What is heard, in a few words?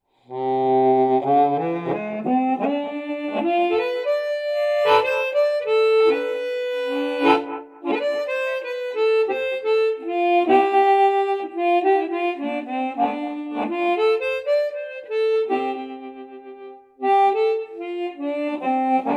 Bluesharp Chromi Improvisation